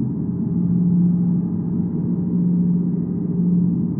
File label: sparse soundscape samples; ReLooped